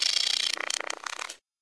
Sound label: pod hit; hl2